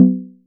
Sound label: Chord; ModelCycles